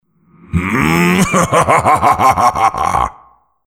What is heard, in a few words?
coal barrage